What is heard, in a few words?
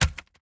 wood hit; teardown ps5 windows xbox series xs gamerip 2022